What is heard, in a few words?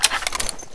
assault reload, weapons